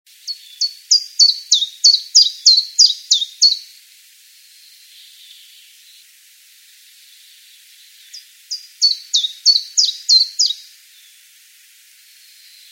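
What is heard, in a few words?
common chiffchaff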